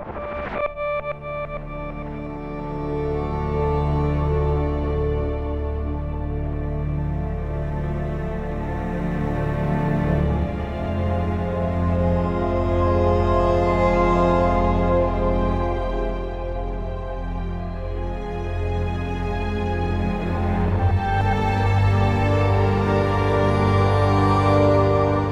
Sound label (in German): Angespannt Loop